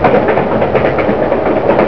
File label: train idle; ambience